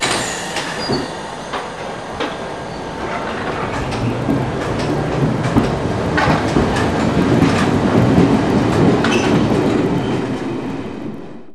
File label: a stock; simutrans; Pak128.Britain-Ex